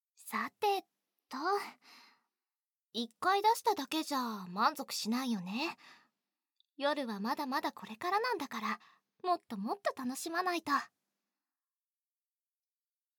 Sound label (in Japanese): ねっとりフェラ